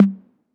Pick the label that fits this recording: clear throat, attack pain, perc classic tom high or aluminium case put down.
perc classic tom high